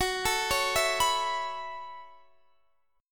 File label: F sharp Minor 11th, Guitar, Open D